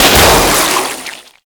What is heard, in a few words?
Balloon Pop; Festivities; Warframe; Follie